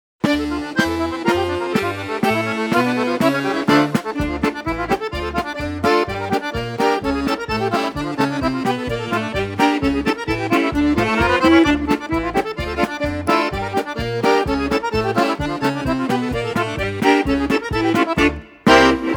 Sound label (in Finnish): Tampereen polkka